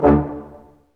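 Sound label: BRS, Brass Ensemble stac; Roland L-CD702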